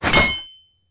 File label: sword